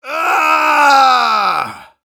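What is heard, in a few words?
pain critical death, Russian; Engineer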